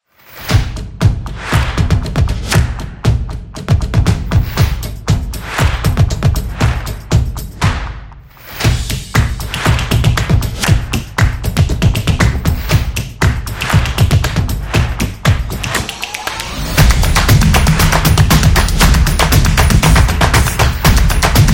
Intro TV; casual game

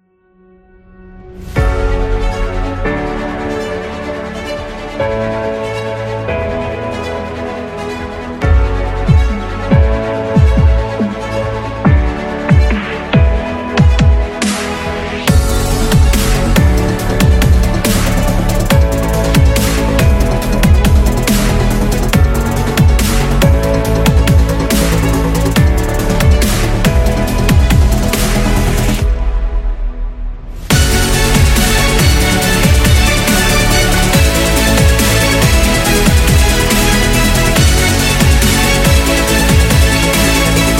Epic Background; self motivation

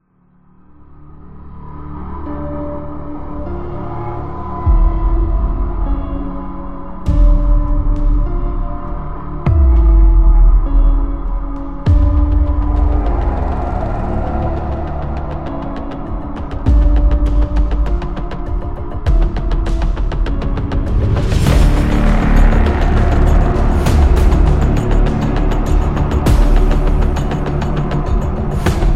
A Suspense

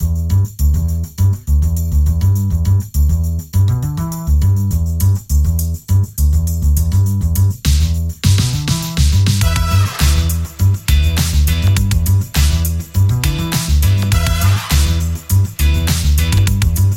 The Funk, jazz club

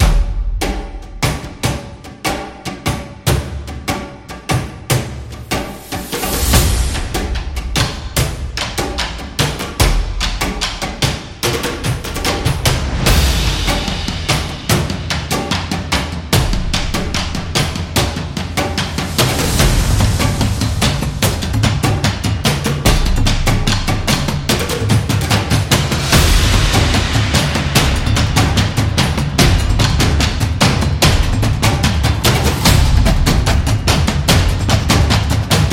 Tribal Cinematic Drums; monster attack

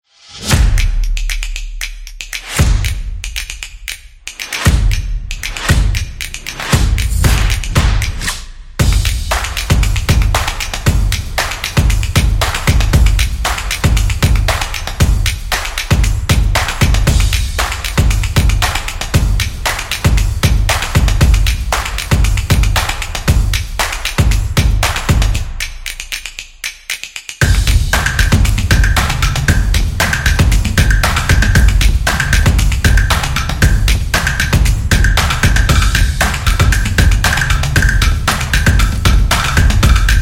Drums Action

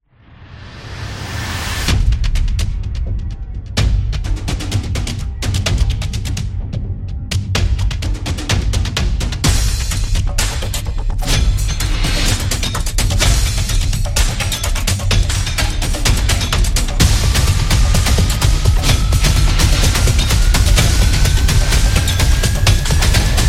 Epic Trailer Percussion; monster attack

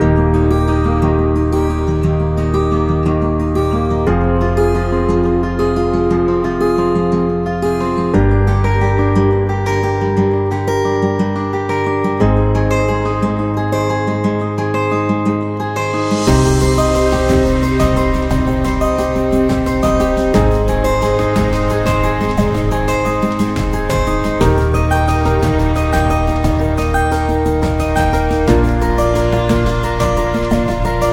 Elegant Love; waiting music

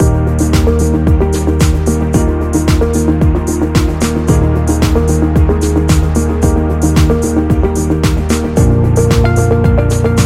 Education Loop Corporate

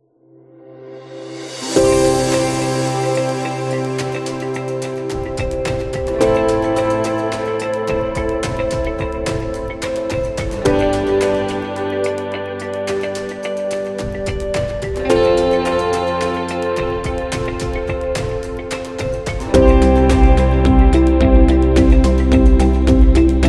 Elegant Positive, corporate music; corporate music vol 21

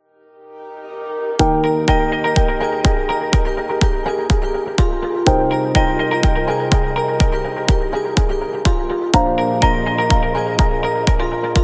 Corporate Uplift

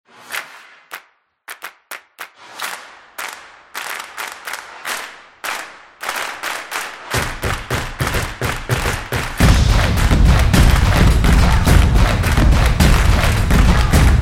Stomp Corporate; positive vibe

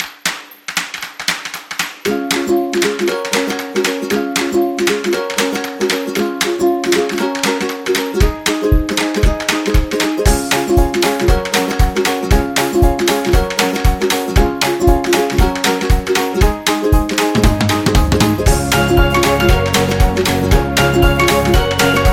Ukelele, happy music

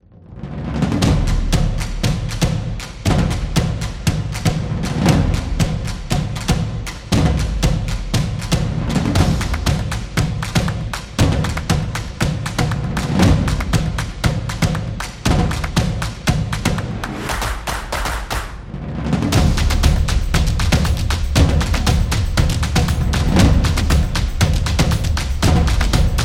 Impactful; high energy

